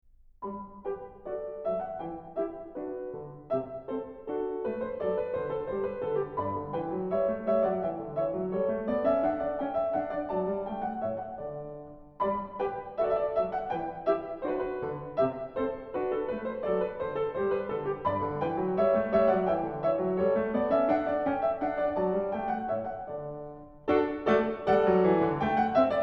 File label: Piano; Bach; French Suite 5; BWV 816; Gavotte